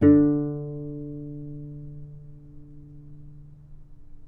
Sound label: D3 - Pluck, novice cello